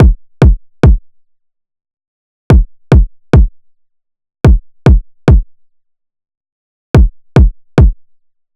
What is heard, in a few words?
Drum Loop - 108 BPM Kick, experimental; Drum Loops; Loop Stems; Cleansed